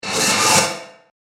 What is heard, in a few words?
Horror Intense Slice; Evil Sound Effects; Andrew Fleming; Twistedtracks